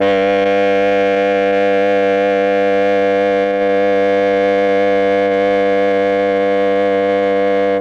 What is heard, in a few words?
OBOE; ILIO; Propeller Island Legendary M400